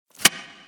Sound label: victory arrow pull; smite; chiron